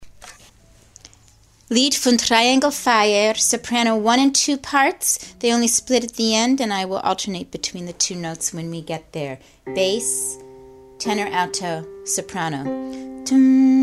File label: soprano, chorus; Lid Fun Trayengl Fayer